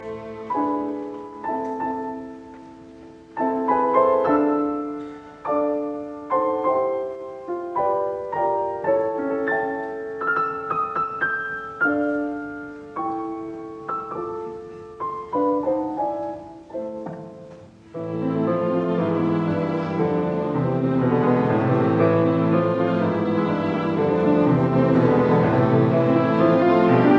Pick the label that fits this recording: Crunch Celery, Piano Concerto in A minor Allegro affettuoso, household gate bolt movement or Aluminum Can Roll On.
Piano Concerto in A minor Allegro affettuoso